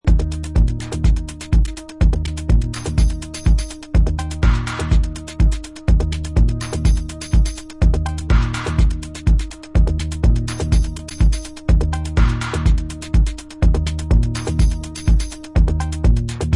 late at night (instrumental)

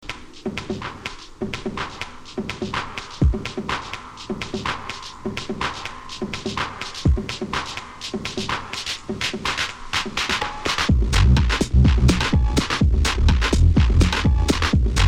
tape grunge rave

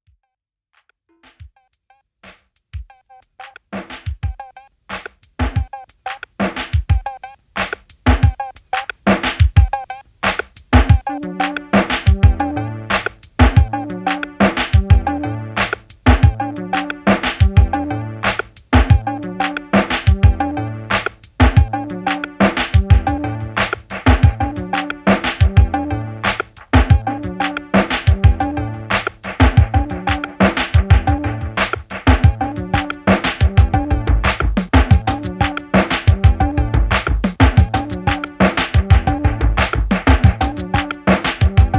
Drumtraks

